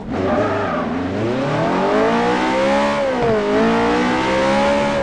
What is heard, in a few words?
first, vehicles; 458; TDMCarpack; TDMCars